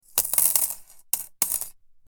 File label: Coins dropping